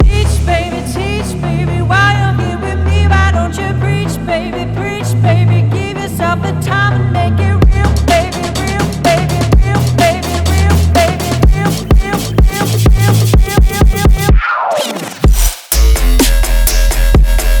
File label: workout music, 80s workout song, house music remix